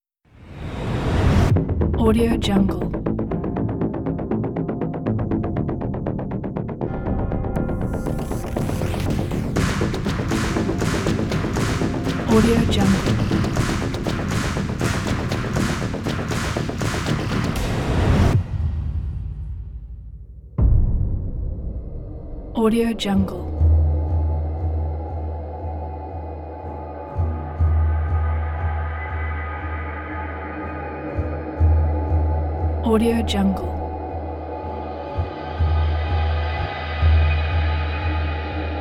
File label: creepy suspenseful